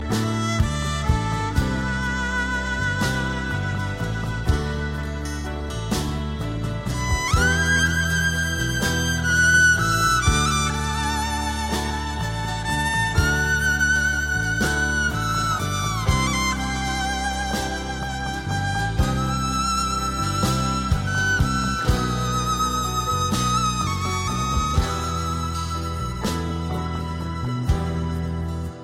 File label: sweet violin; classical